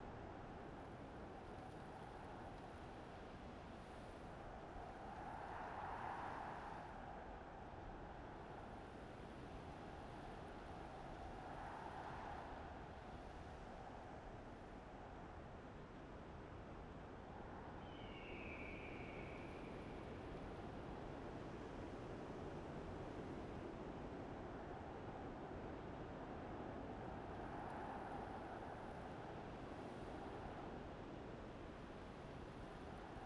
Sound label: Ambiance Badlands; Utah Badlands